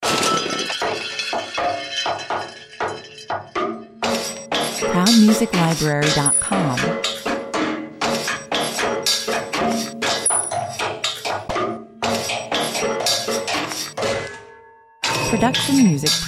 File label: pipe and drum